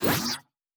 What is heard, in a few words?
Device Start, Electric